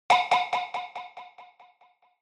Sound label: Onoma-Pop (High-Delay)